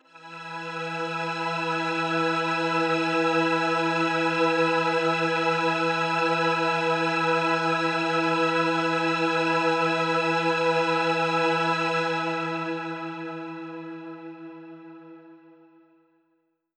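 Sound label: Mach String E major, synth orchestra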